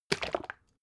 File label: bottle syrup upend glug; berufe; gastronomie